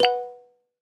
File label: message